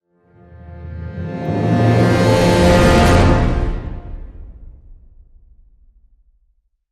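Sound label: Hit Orchestra Brass F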